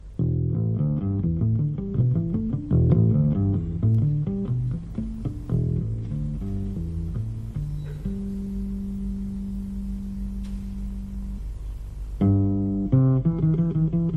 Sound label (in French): contrebasse